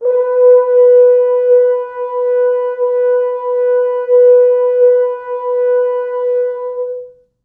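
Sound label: FrHorns, French horns; East West; Quantum Leap Horns Sax; 4 FrHorns MOD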